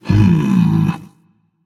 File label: nv thinking; smite; voicepack; ganesha